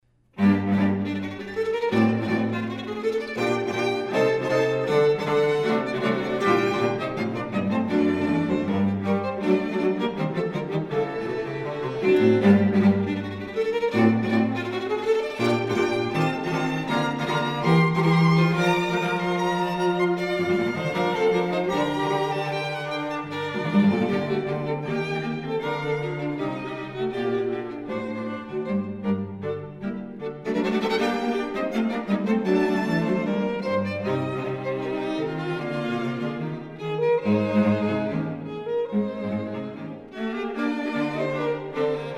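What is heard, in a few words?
string quartet; foote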